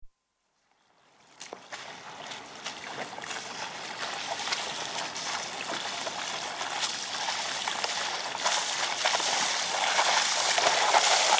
Windsurfing, board passes (Recorded on nearby boat); BBC